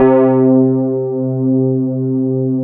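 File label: Fender Rhodes C2, Keyboards Old School; USB Soundscan vol.09; AKAI; FEND.ROAD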